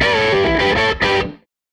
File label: Gretsch Guitar Loop